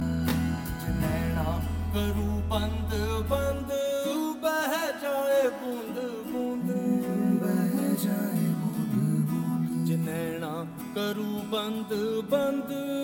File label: Male